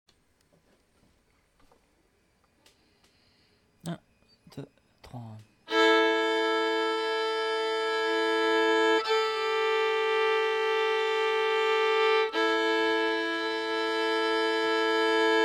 Arrangement double et simple cordes, violon; Pochette verte

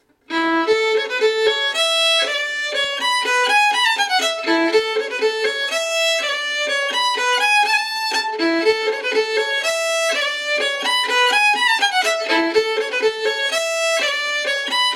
Allemande, violon

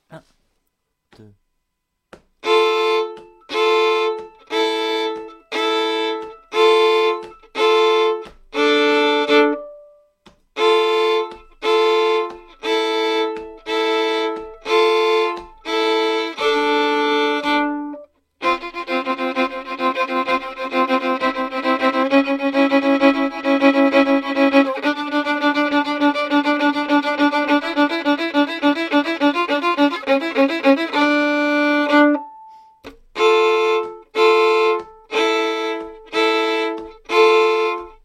doubles cordes, violon; Steltendans-2

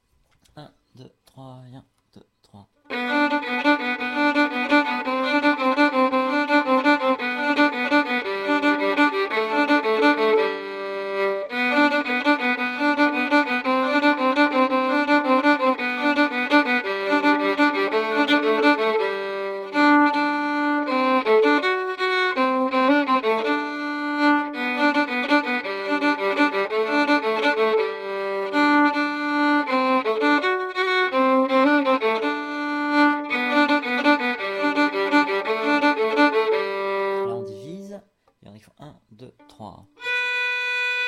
Valse groupe sans double cordes, violon; Valse de Jamin

